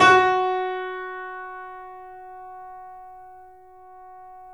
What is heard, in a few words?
F#, The Piano; InVision Interactive - The Piano